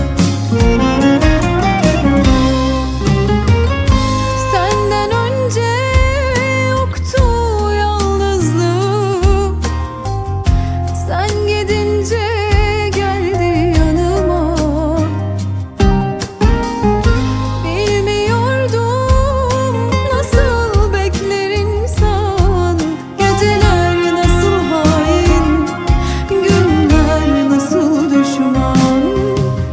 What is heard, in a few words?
Ringtone Mobile